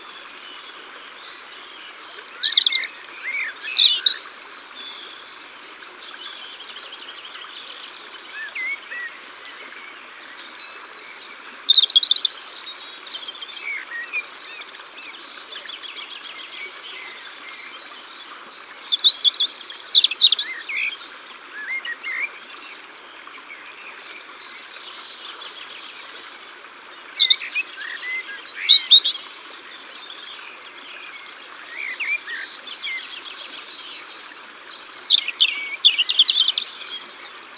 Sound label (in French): Martin pecheur, Au bord de l'eau